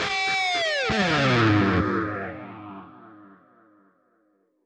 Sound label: Pan Guitar